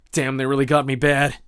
hurt heavy; Escape from Tarkov

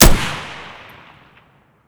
ak74 raw close; Escape from Tarkov

